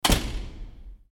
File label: Close; Treasure of Nadia